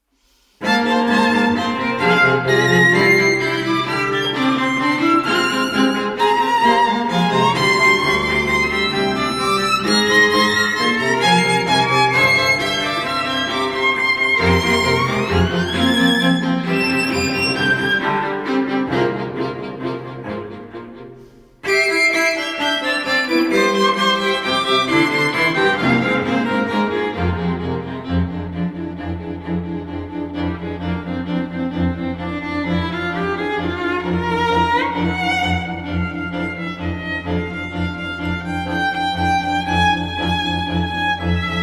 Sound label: String Quartet